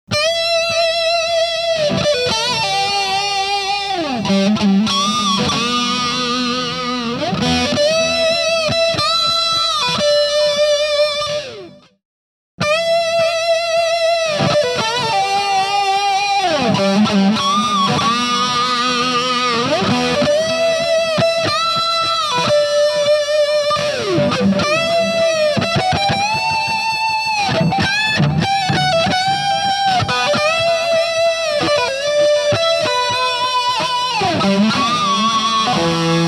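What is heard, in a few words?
Solo RH Big Muff alone compared to RH GE7 Mesa, guitar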